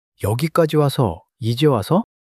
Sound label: surprise